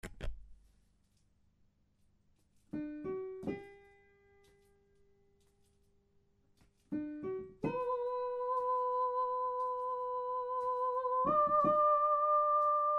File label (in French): hommes